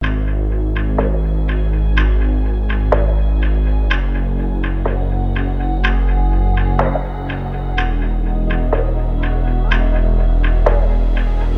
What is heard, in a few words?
Phonk